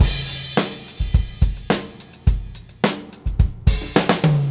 Beat Loops, 106; Zero-G; Monster Beats

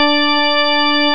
KURZ ORG D4, KURZ ORGANS; Greytsounds VOL-1

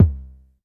Polite kicks long C2; LIMAT Div Dept; Drums

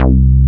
TEK C3, TEK BASS; Drums In Your FACE!

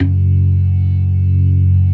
ORGAN, C3 PERC; Keith Emerson Signature Series

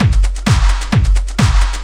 drum 130 techno; Techno Trance; DRUMLOOPS; Techno Trance Essentials

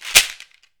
SHEKERA, African perc; AKAI S6000 CD-ROM - WorldSounds

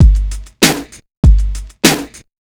Kick and Snare

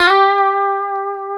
G 4, Guitars; Spectrasonics; Hans Zimmer Guitars VOL-1; 90's Sample CDs; COMP POP+VIB

